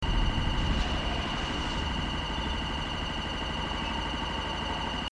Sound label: Blaster; Star Wars